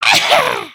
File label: flex; emotes